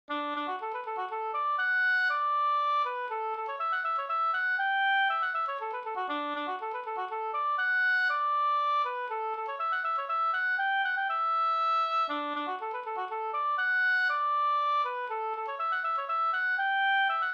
polska Bingsjopolska; Kronprinsen

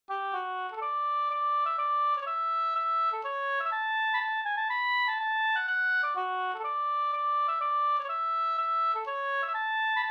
polska Skomakarepolska

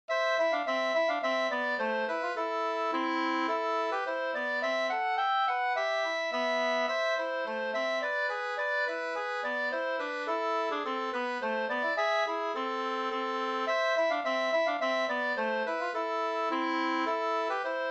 slangpolska

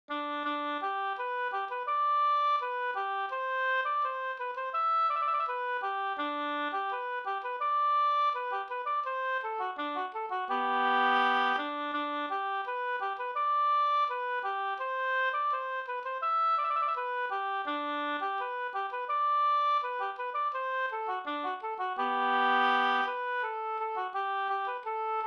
brudmarsch Gdur; fr Skokloster

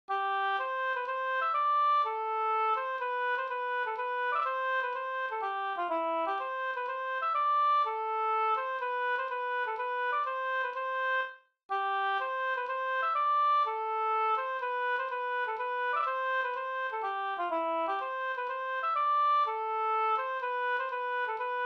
hamburska C dur

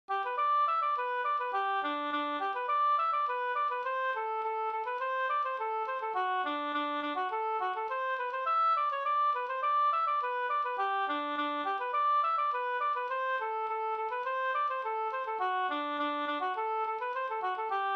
snoa

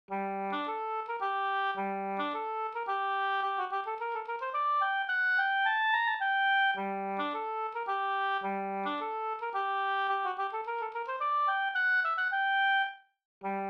polska

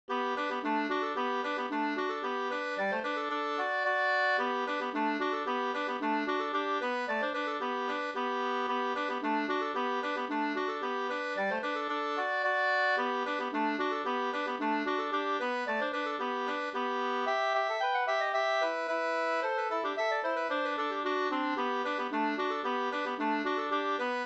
kadrilj

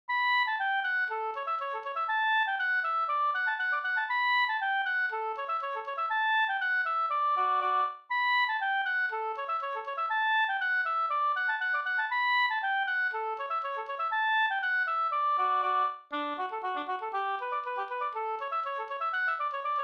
folkdans; Attamanengel

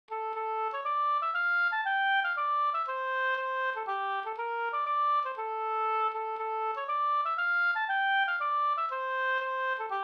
polska Bodapolska

